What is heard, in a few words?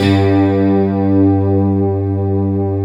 JD MIDI GT G2, JD GUITAR, Synth; Big Fish Audio; Synth City